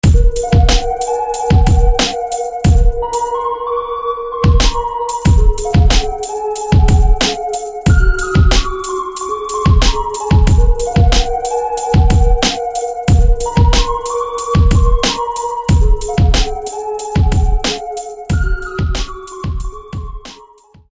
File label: Echo Sonar